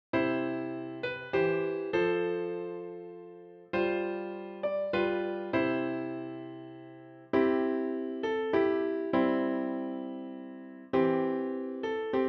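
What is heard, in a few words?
piano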